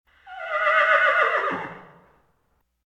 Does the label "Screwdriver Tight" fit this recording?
No